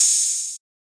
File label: Bonus Open hat, Hip Hop; drums; STYLE KITS (Hip Hop, Etc); Khezie Hip Hop Kit